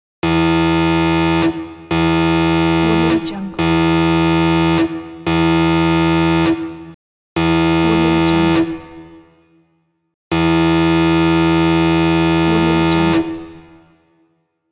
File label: warning alarm sound